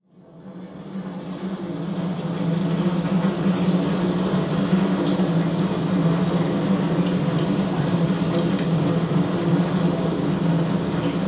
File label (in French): Ancienne Mine Drone Galerie; Chatelus-le-Marcheix